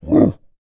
DOG BARK, VOCAL FX; Spectrasonics Vocal Planet; Jazz FX

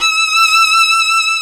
STR SECT E5; AKAI S-Series CD-ROM Sound Library VOL-2

